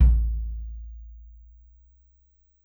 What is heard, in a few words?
18in Kick